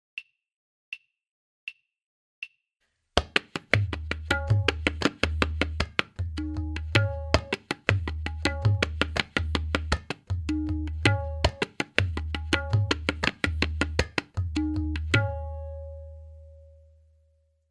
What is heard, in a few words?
Tihai, tabla; Bedam